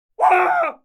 Быстро упал с высоты, звуки убийства; Звуки убийства в ТСБ роблокс

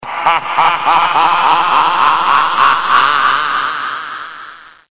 Сильно орет; id звуков в Роблокс

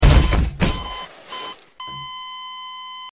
Пук; id звуков в Роблокс

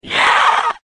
Призрак; id звуков в Роблокс

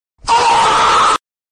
Смех ребенка; Мемные звуки для Роблокс

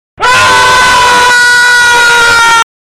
Взрыв; Мемные звуки для Роблокс

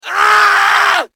Сильно орет; id звуков в Роблокс

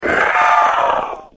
Демон умер; id звуков в Роблокс

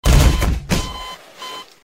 смерть (реанимация); id звуков в Роблокс; Garrys-mod

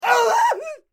Пнули; id звуков в Роблокс

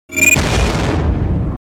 Робот самоуничтожился; Звуки убийства; Звуки убийства в ТСБ роблокс

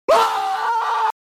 Выпал из окна, звуки убийства; Звуки убийства в ТСБ роблокс

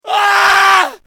Орет; id звуков в Роблокс

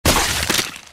Разбился в лепешку; id звуков в Роблокс

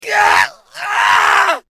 Двойной крик; id звуков в Роблокс

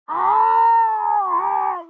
Воткнули горячую арматуру, звуки убийства; Звуки убийства в ТСБ роблокс

